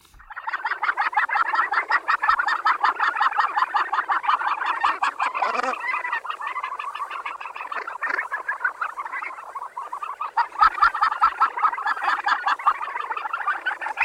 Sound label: australasian gannet; takapu